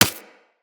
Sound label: Laser Impact Generic